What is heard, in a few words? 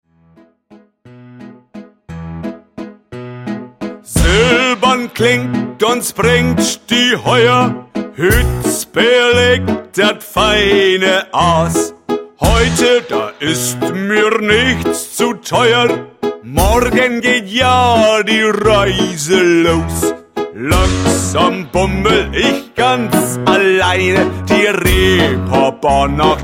Live Reeperbahn